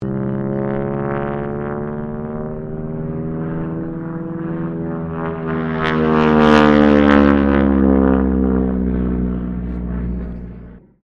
AIRCRAFT AT-6D HARVARD MK IIB DIVE PASS, Harvard Training aircraft; 1942; Airplanes; Vehicles